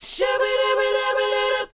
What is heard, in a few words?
SHOO B A#, R&B Dance, vocal; Spectrasonics Vocal Planet